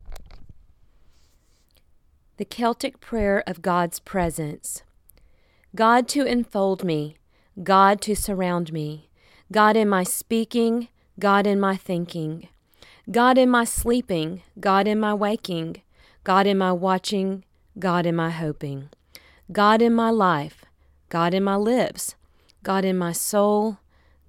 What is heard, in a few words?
Celtic Prayer